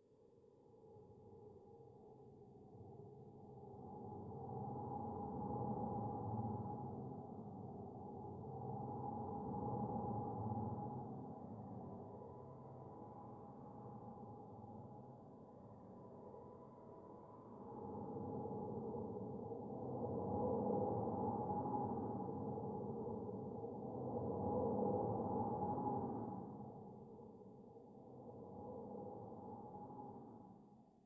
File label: loud atmospheric